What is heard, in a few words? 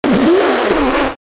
taunt spit; demo; nuke